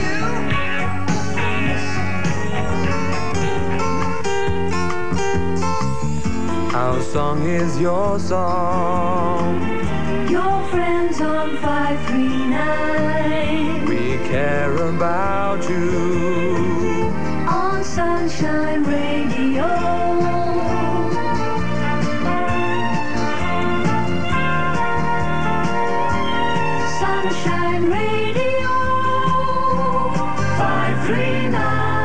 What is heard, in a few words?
sunshine jingle